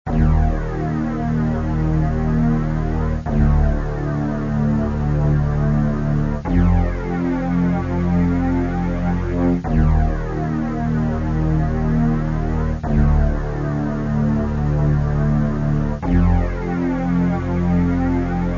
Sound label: Scary Music